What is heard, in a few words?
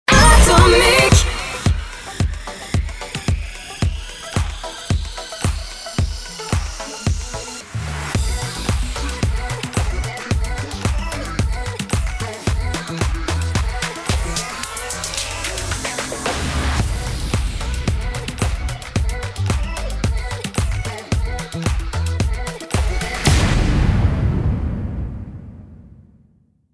Jingle; Atomic Cage